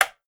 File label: click; math